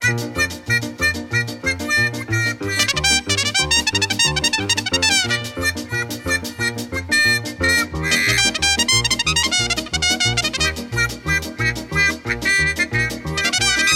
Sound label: stock music